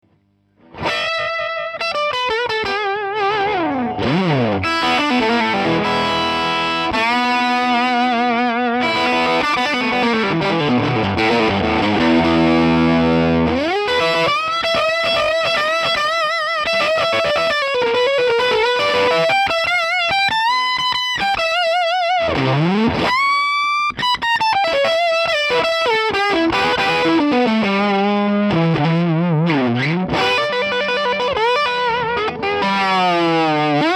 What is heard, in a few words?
disto